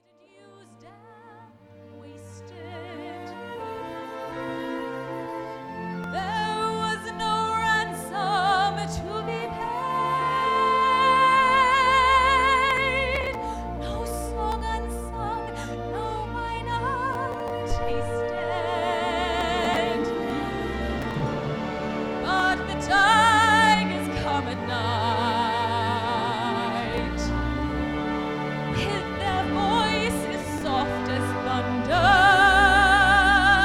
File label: Singing Waiters, London